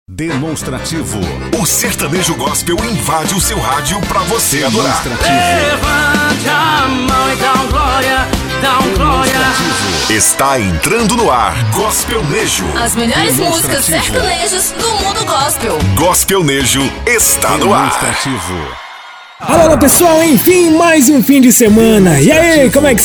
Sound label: gospel